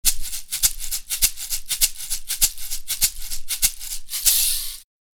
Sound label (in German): regenmacher loop; creaktor; mozee